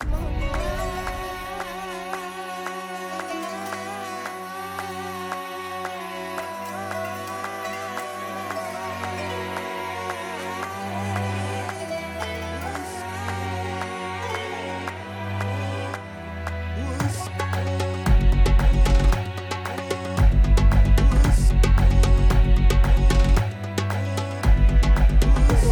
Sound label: slowed version